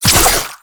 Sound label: Flechette Whoosh; Warframe; Tenno; Oraxia